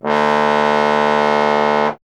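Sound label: bass trombone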